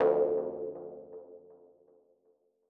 Mono DBeat D2; KG MegaSaur

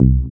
DM BAS, Dance Mega Synth

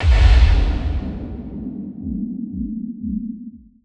Impact; impact samples

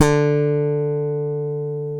E Bass Slap

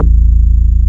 ORGO PLUK

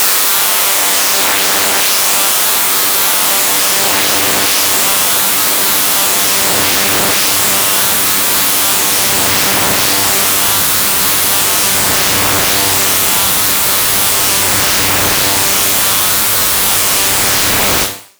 Coil, FM; Polyend

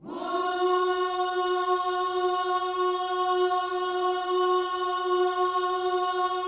WHOO F#4, gospel; Spectrasonics Vocal Planet

